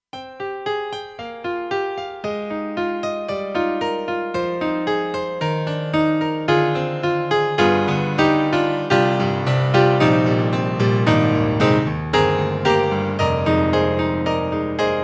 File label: Piano; Roland; SC-8850